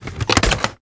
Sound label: 9mm clip; items